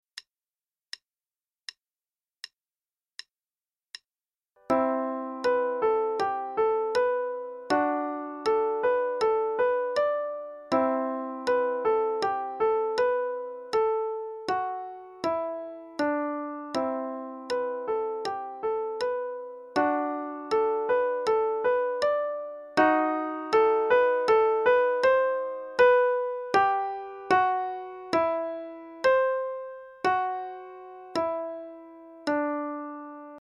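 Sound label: Play-a-long, quarter note=80; Roubos; The Peacock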